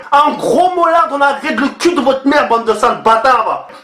gros molard

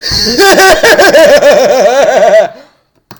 rire; goofy

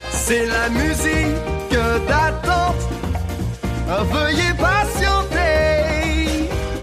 c'est la musique d'attente

